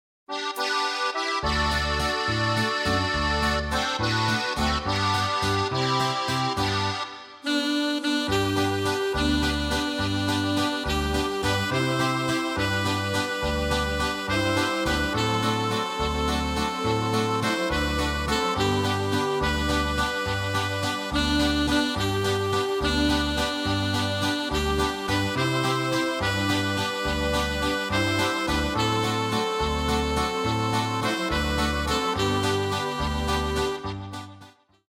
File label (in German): Trio; xg Demo